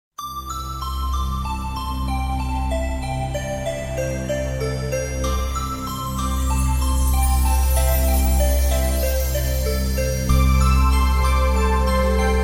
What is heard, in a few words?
Innocent Chimes